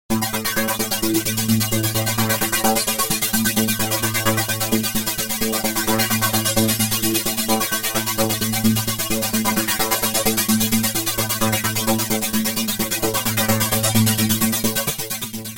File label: Sytrus Flanger